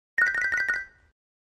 Xylophone Shrt Trill, mallets xylophone

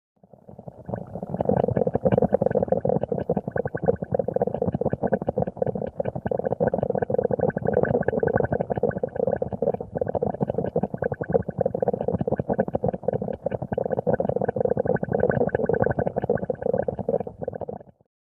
Bubbles From Underwa

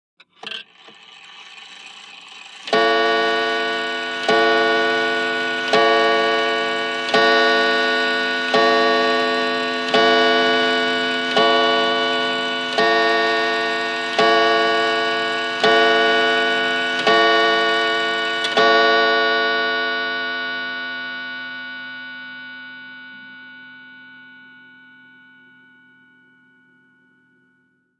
Mantle Clock Strike

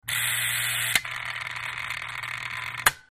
Relay Electric Burs, buzz

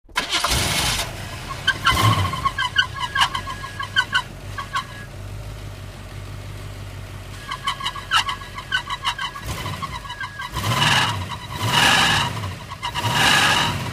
Car Start Idle Bad St; car various

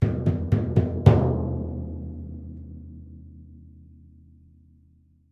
Timpani, (Hands), Final Hits

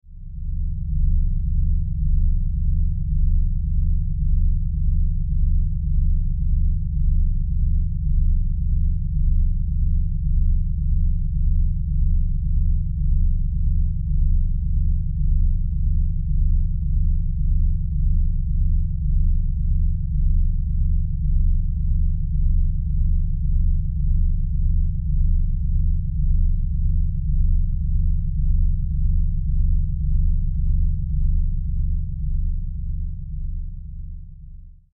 Energy Source Pulsin, spaceship fx

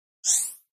Button Elect Zip High

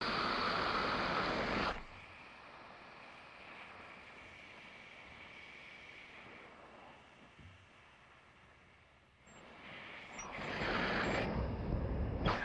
Steam Pipe, steam

